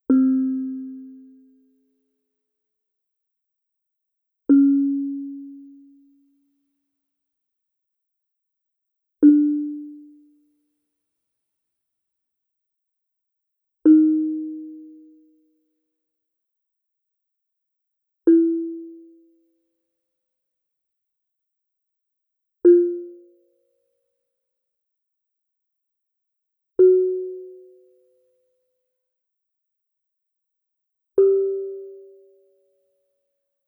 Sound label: chrom bonang; sounds of mtb